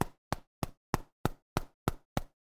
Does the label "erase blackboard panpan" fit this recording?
Yes